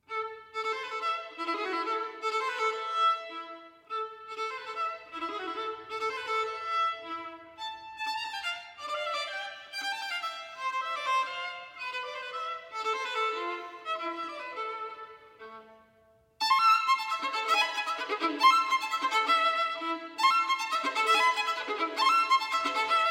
Caprices for Solo Violin in A Minor. Tema con variazioni. Quasi presto